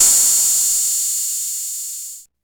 crash Sound Sample A Key, crash cymbal tuned to the A note. Loudest frequency: 8124Hz; cymbal drum samples